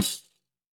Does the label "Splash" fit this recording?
No